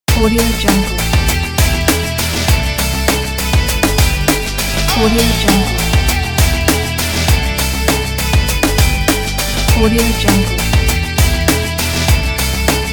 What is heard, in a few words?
rock logo